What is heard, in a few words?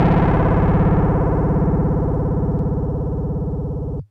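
Fuzz Clav DX100 C#1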